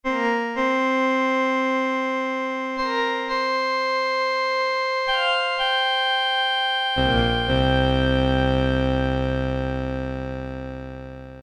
Trilling Pipes, Korg Poly-800

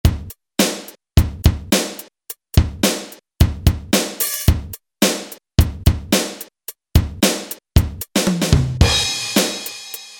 Dry Set; Roland U-220; Factory Patches

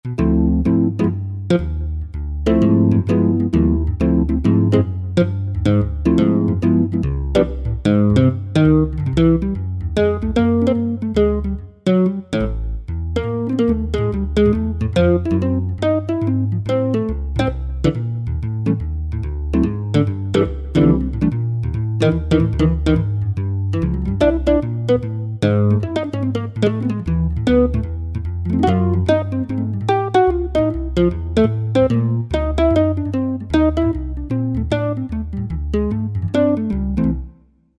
Roland V-Synth Nylon Floot; Factory Patches

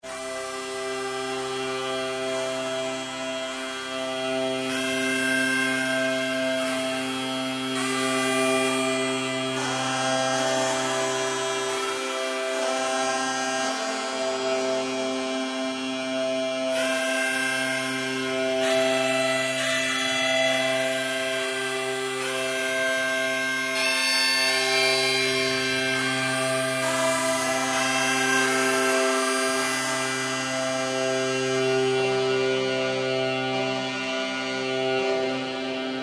Pipe Bags, Roland PN-D50-03

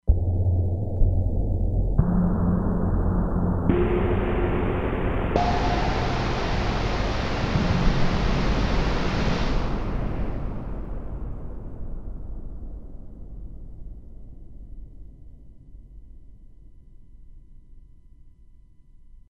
Big Noise, E-mu Proteus-3; Factory patches

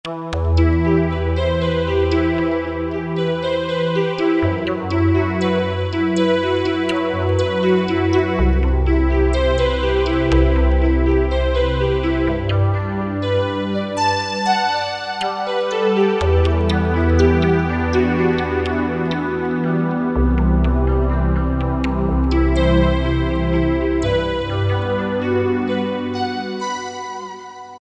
Far Away, Alesis Z5 Vintage Synthesizers; Vintage Synthesizers And Classic Beatboxes

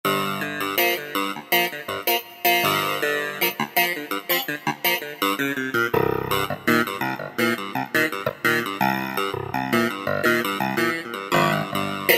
Clavinet

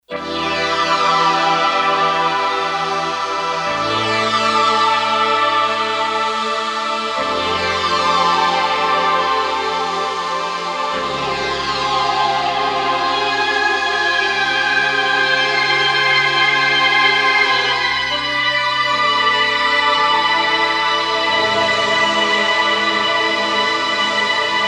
Future Band, synth

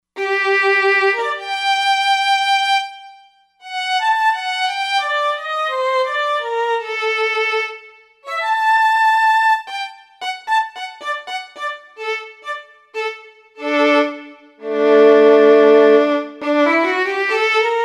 Violin, Roland Fantom XR

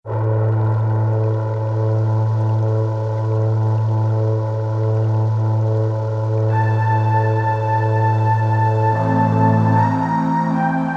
Lo-Fi Rain Str, Roland SC-880; Factory Patches

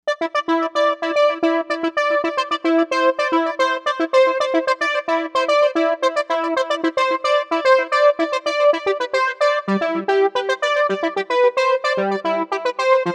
Soft Sequenca, Novation UltraNova; Factory Patches

